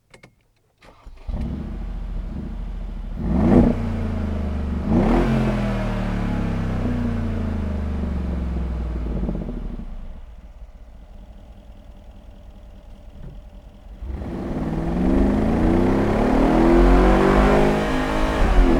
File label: Mercedes Benz G63 AMG